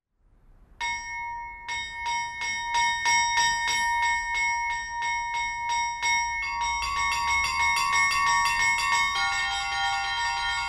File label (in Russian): Колокольные звоны, Софийский звон; Колокольные звоны Татарстанской митрополии; kolokolnye zvona tatarstana